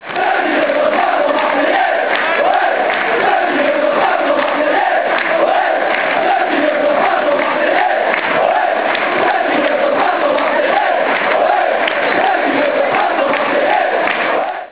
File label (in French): Ceux qui ne sautent pas sont Marseillais, chants